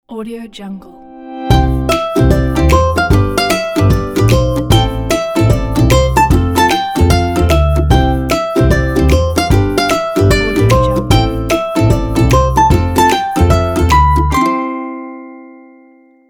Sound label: happy ukulele intro logo